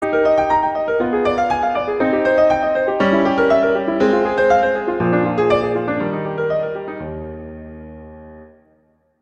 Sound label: Prelude - Eb major; Clementi; Preludes